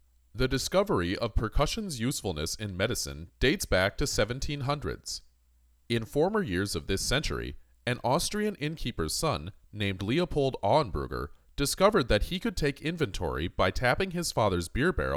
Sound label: Percussion